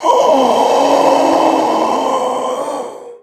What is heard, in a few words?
idle, male zombie; nmrih; zerosiki; slender